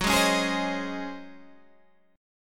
C sharp Major 7th Suspended 2nd Suspended 4th, F sharp